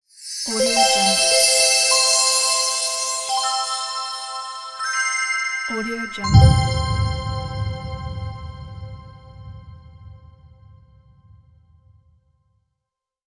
magical

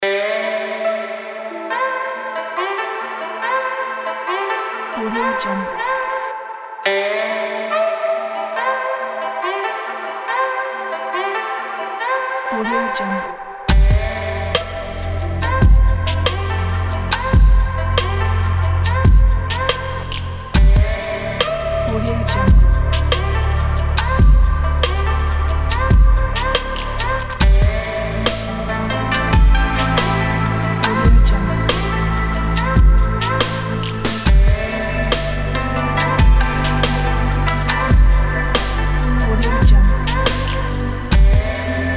smooth asian hiphop

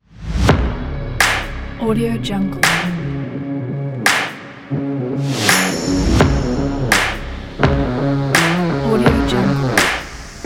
indie rock; lulu-production

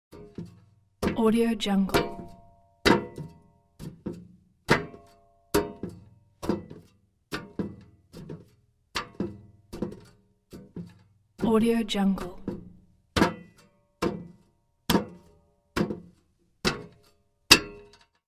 body crawl inside vent

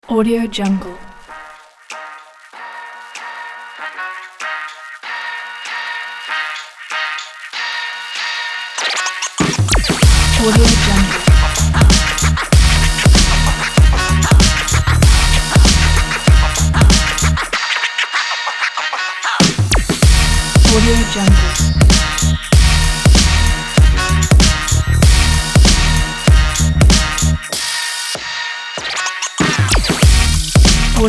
fashion hiphop aggressive beat

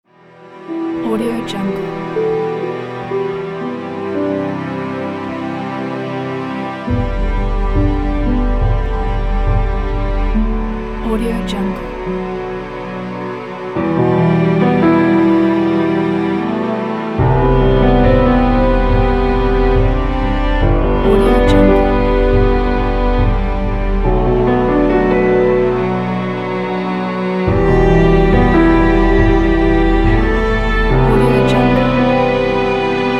sad story

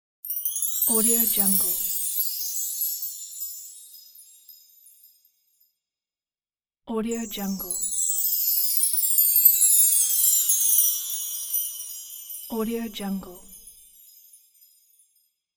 gold shine

